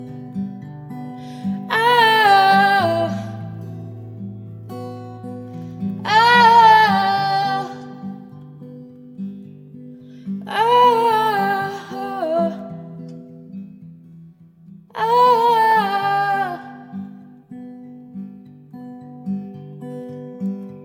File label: Oh Chorus